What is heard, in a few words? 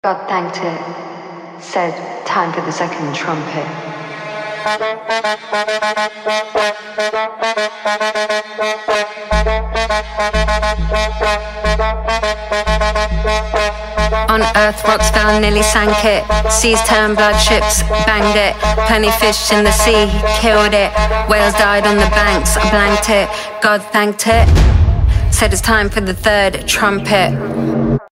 TRUMPET